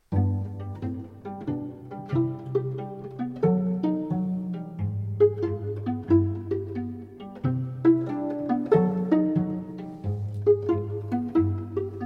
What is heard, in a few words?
strings; classical musicians